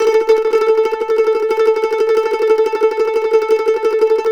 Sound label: MANDO TREM A4, Country